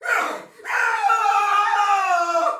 Death Fire Soldier Male; Dialog; Barks; Battle; Sinecure

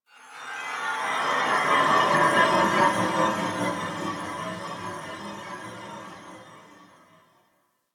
Chime Texture; Warframe; The New War; Zariman; Apartment

